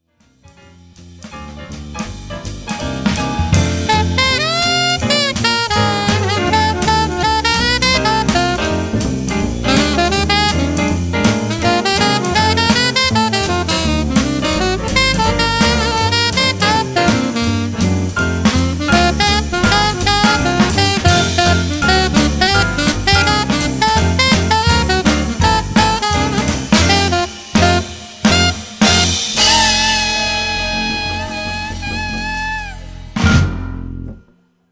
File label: Drums Groove